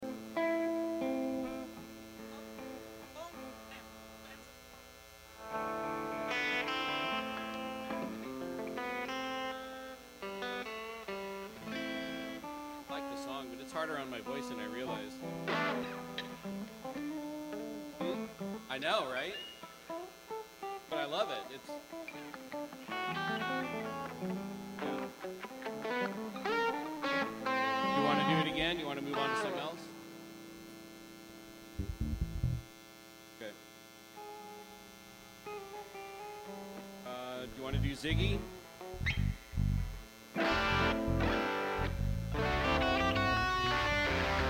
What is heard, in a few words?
Buzz and Feedback Mix